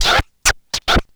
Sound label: Turntables